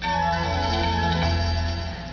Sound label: lights